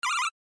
Racing Tire Squeal

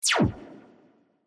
Cyber

